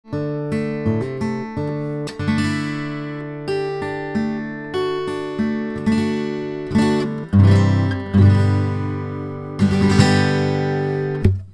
12 fret, Westerngitarrenaufnahme

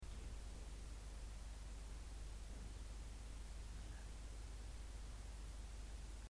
rauschen

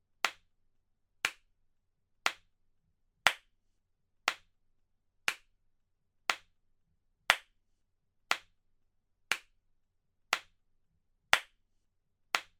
clap einzeln

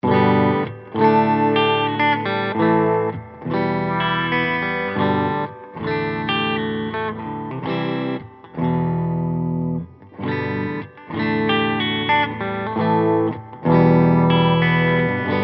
clean unbearbeitet

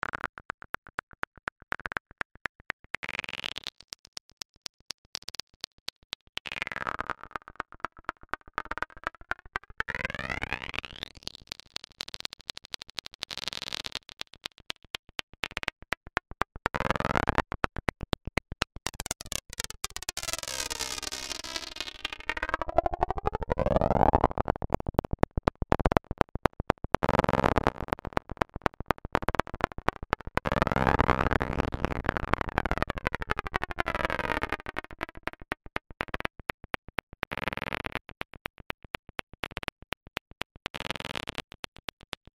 klacker glitch

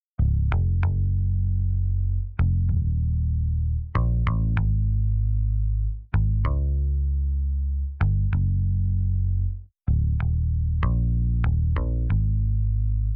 wide bass, stereoverbreiteter bass

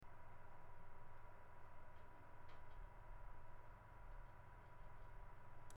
grossmembran mikrofon aussengeraeusche hoerbar

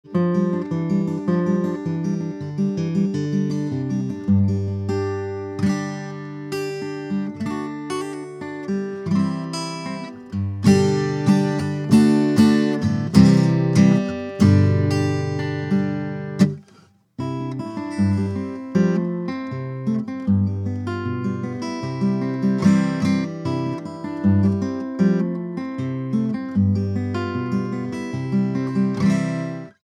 solo, Westerngitarrenaufnahme

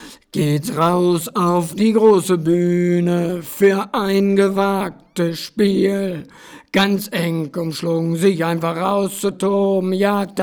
chor tief halb rechts

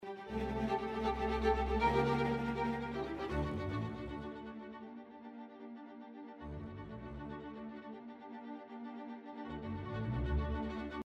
Streicher Quantisierung

